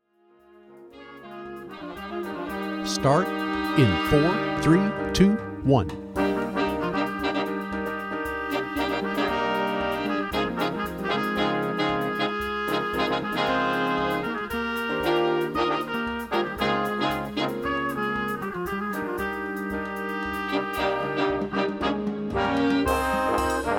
sambamorphosis bass

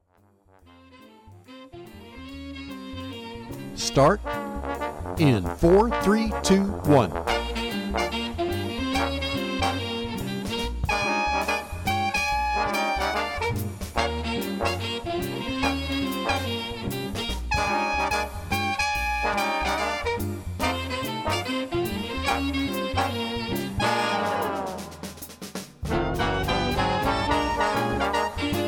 piano

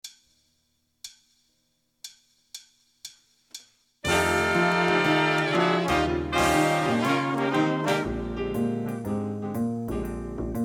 bb blues for improv winds; winds audition

